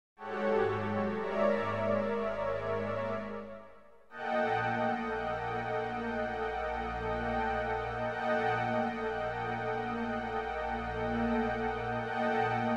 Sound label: divertimento d major